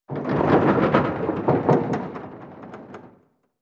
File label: ac130 rattles